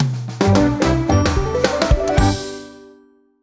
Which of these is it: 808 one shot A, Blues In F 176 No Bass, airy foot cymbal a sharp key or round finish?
round finish